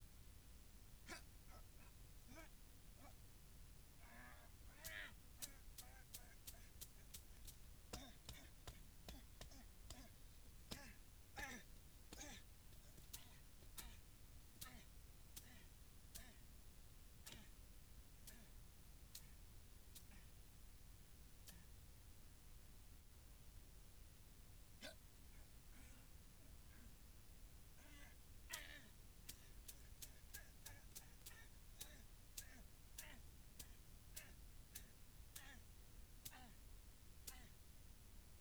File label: snake bite; cormi